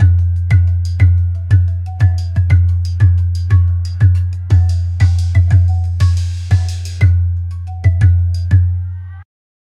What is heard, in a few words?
tribal drum aztec hawaii